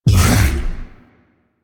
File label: Bowl Jump; DE; Warframe; Warframes; Grendel